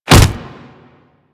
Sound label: Generic Hit; Warframe; Characters; Tenno